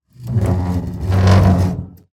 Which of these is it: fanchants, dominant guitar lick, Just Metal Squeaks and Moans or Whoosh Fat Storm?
Just Metal Squeaks and Moans